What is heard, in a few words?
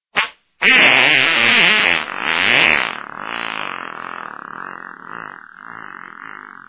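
farting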